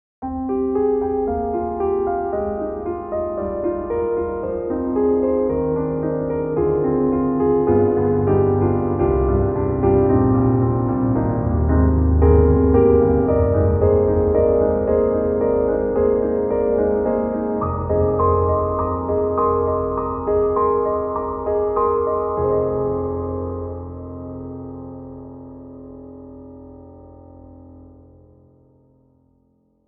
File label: CFX concert grand, contemporary, soft and moody; GARRITAN